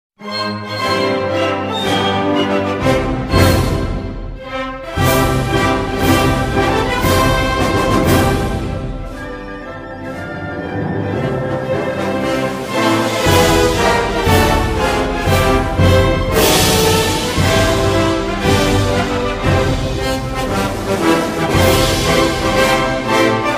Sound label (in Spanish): Himno Nacional Mexicano Instrumental